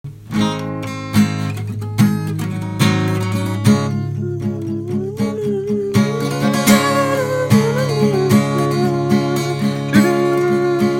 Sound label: transition to chorus strings ascend